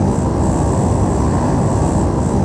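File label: vehicle steady loop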